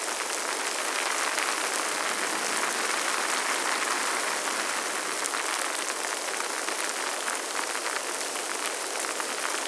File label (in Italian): Pioggia, natura